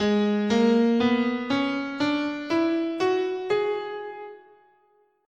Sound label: Locrian sharp2, scale, guitar; Gsharp